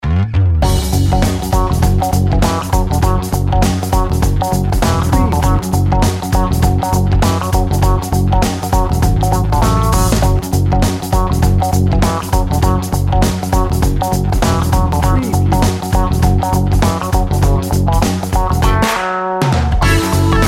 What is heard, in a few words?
80s chill